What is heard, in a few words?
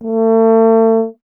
tuba; Miroslav Vitous - Solo Instruments VOL-2